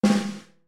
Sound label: snare